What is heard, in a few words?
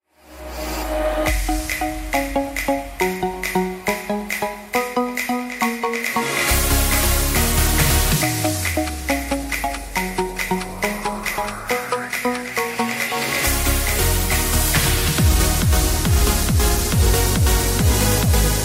Trance